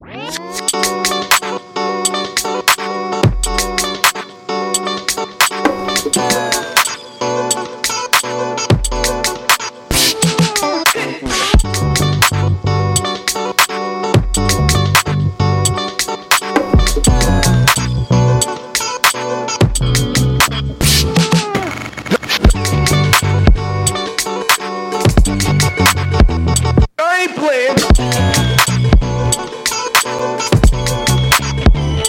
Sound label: Lo Fly Drums